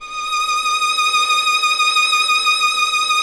violin, Solo Instruments

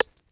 Rim Classic; Lost Tapes From Mars; Clap & Rim

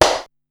Tenny Hit, Korg Wavestation-SR

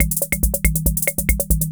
140 POPS

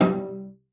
CELLO FINGER G3; Xsample VOL-5

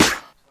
SD, Dance Mega Drums; Best Service; SD-HIP

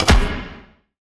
Two Step, Industrial Hits; Antidote Advent

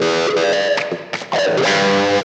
GTR; East West - Phat & Phunky CD2; 90's Sample CDs

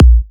Electro Kicks

